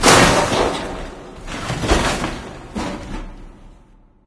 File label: metal debris; ambient; Left 4 Dead 2; Source Engine; random amb sfx